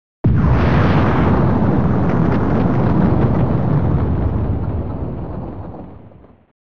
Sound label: megabooom; battleships